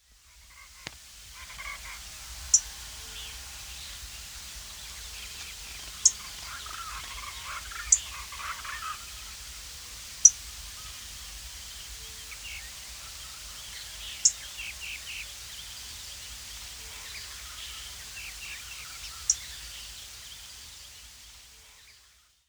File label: tocuyo sparrow